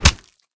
defeat; smite; khepri

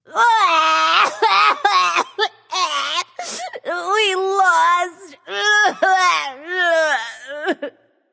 boo hoo; daji

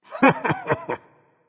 heh heh berserker; cuchulainn

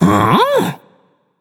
confused, voicepack; smite; jorm

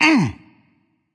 grunt hit, voicepack; smite; vamana

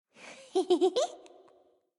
lobby landing; smite; god scylla

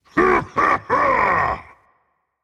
yay rage; chaac

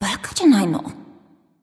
grumble; smite; amaterasu

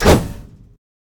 swing; smite; kuzenbo

